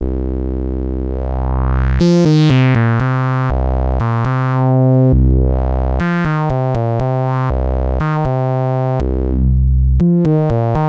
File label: filterfm